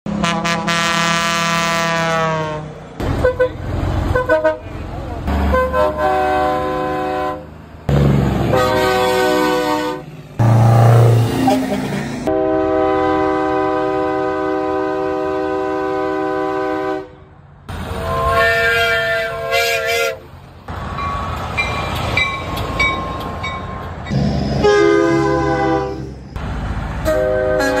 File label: trailer truck horn